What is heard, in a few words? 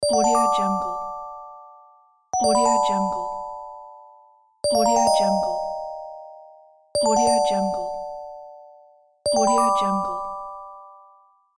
bright win game